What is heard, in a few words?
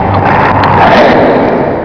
growl